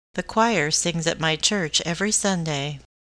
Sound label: choir